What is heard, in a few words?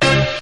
single hit, Stabs